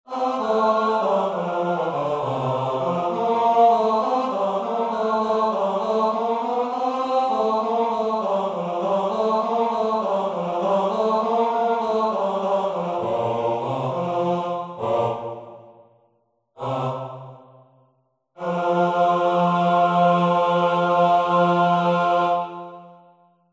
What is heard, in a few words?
Tutti Act 1 Finale Bass